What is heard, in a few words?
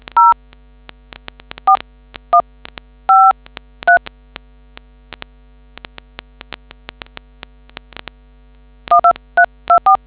dtmf